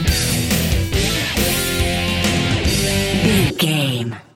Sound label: rock power stinger; Sports; Pop Rock; NFL; Football; Sports Music; Hard Rock; Metal; Driving; Bikers